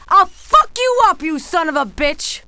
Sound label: female die; kingpin loc